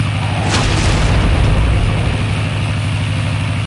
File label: bomber Bomb Blast